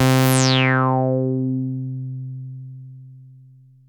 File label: 303-Style single hits